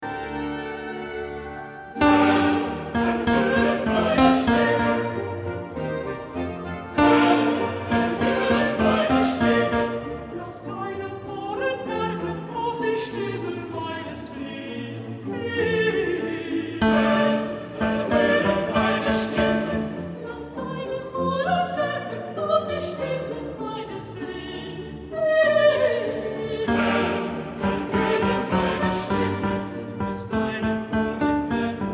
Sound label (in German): Bach Aus der Tiefen, Tenor